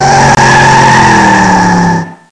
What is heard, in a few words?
Car Go